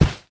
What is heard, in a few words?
Carpet; devour 2021 gamerip pc macos